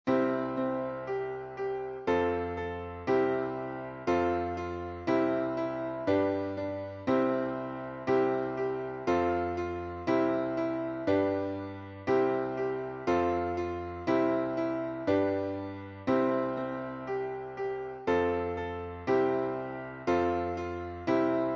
twinkle twinkle little star

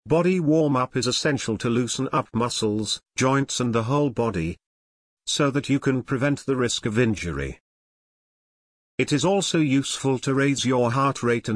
body warm

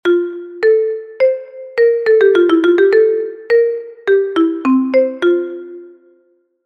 marimba

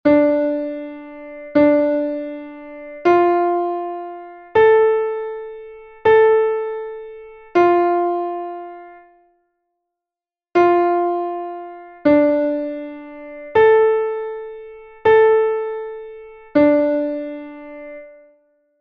12 notes rest sound

